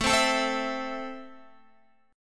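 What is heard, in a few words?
A Minor, Guitar, chord strum; Am strummed; JGuitar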